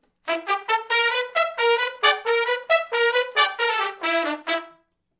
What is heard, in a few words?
R and B Riff